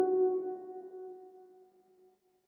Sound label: swap